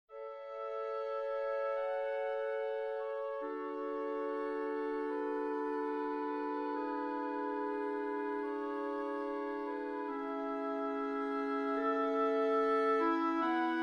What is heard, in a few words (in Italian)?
Preludio sinfonico